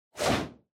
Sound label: Motion Agility Short; モーション・俊敏